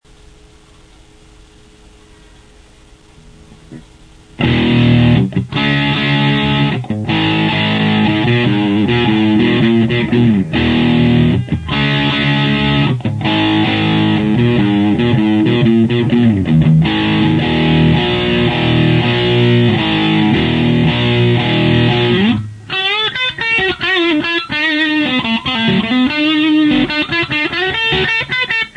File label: new amp full lo